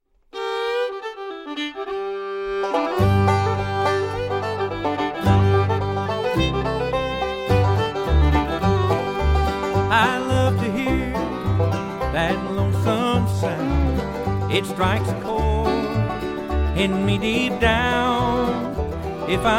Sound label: just like a fiddle